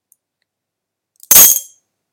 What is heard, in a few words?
Auditory Icons Balls; oviatt